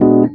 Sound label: RHODES; Houseworx